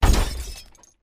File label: glass break